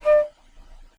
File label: staccato